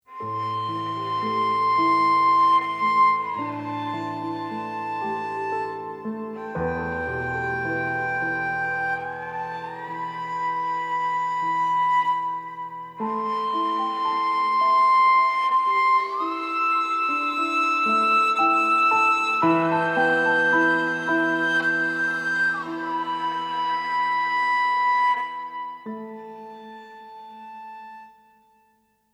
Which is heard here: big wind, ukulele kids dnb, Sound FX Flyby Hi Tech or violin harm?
violin harm